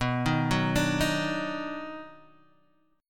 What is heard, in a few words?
D sharp Minor Major 7th Sharp 5th